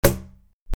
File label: cajon snare; creaktor; mozee